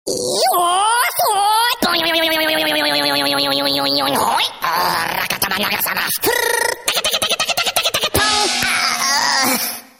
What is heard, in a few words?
Funny Noises